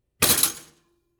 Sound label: Toaster Out; soundscrate